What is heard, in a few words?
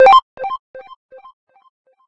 Success Beep Three Note Climb Delay stereo; Super Metboy; PUZZLE